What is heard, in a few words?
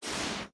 spawner respirator push; Factorio